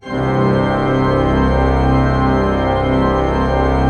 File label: C1, Cathedral Organ, PED VOL WERK; Propeller Island